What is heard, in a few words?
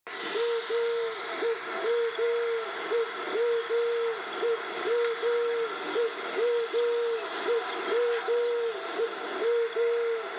Dove